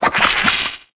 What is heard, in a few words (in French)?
Cri